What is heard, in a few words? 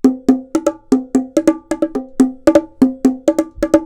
Bongo 124bpm